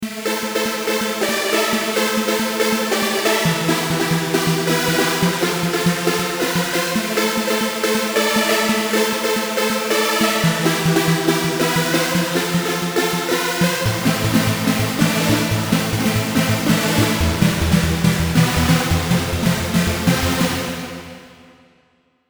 Trancy; fantom-x8; patch demo

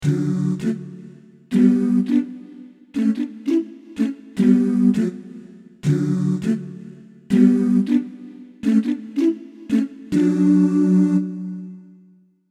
Jazz Doos; fantom-x8; patch demo

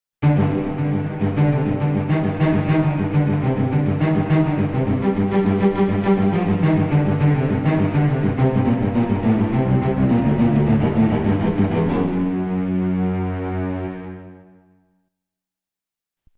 Agitato Vcs; xp-80; roland; roland demo cd-3

